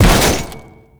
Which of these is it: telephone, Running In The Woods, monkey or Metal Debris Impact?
Metal Debris Impact